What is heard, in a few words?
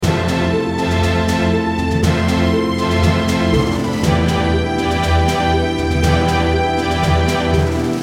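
Short RPG, BGM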